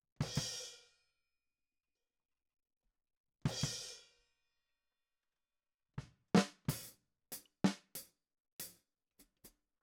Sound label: Snare